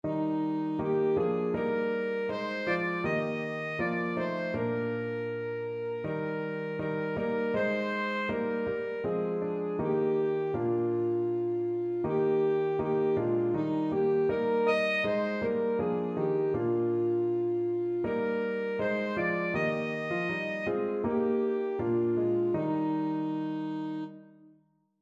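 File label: alto saxophone; duke street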